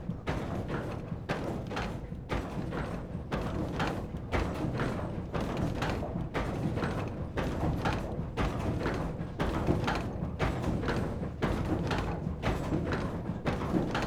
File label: ANTIQUE MILL TANNERY HAMMER DROP IMPACT RHYTHM